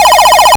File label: Arcade Riffs